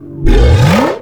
Ogre Birth

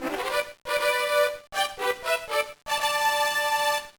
Level Up; Metal Max 2 Kai; gba

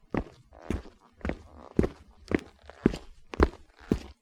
Footsteps

